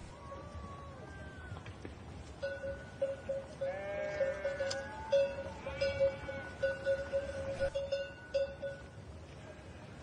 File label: goats; Minecraft; Votive 1.21.1; Votive Content